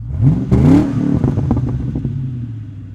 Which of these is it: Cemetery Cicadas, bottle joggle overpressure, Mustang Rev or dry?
Mustang Rev